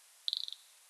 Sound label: Leptophyes punctatissima